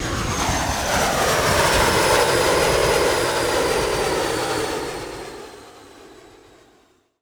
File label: atmo; Techno Trance Essentials; FX & ATMO